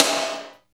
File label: SNR, Tekno Industrial; East West